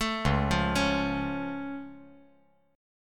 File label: Db Augmented, down; arpeggiated; JGuitar